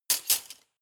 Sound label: cutlery large drawer place